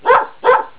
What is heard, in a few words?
message; AT&T i'm here; Instant Messengers